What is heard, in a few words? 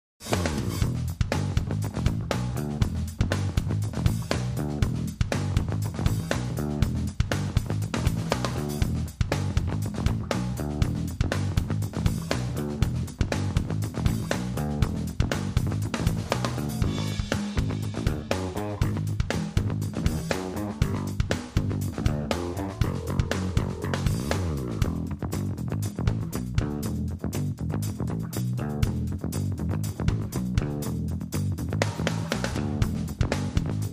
funk drums bass